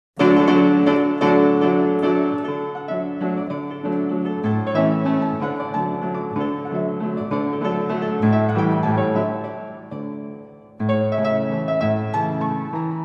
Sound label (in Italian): Mazurka Re Mag